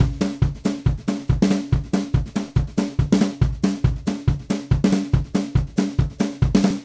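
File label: Country Drums, Bridge Section 2; Digidesign; Pro Tools Essential Loops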